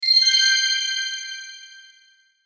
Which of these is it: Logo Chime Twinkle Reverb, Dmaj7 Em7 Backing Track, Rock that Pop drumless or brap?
Logo Chime Twinkle Reverb